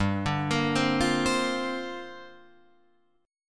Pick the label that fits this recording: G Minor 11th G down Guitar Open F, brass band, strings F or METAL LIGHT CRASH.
G Minor 11th G down Guitar Open F